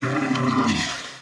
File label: turtle rider rear up; npc; courier